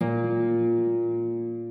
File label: harp